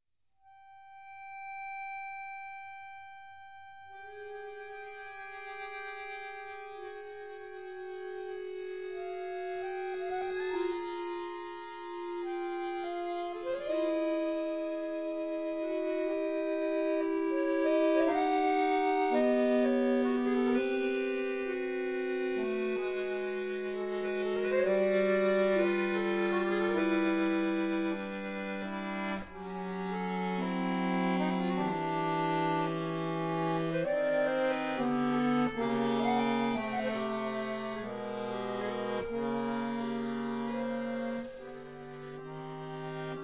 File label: jazz partita